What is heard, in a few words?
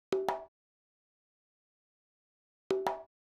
Bongos, Hip Hop Exotica, 093 Amin; Big Fish Audio